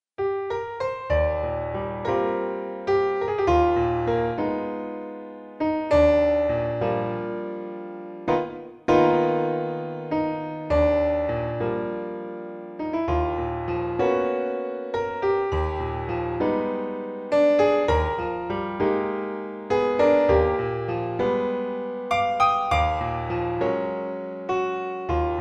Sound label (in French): Piano seul